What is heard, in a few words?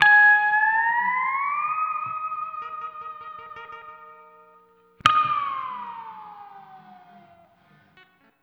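Steel Guitar, G#; Zero-G Kocktail Kollection; Construction Kitz (Lounge); Volcano at Sunrise 074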